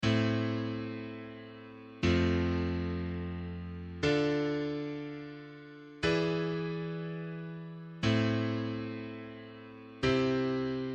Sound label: chord progression